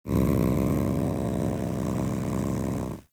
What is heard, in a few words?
Catbrow Feral Vocal Idle; Enemies; Warframe